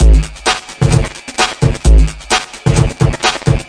130 bpm way overloaded breaker, drum loops and breaks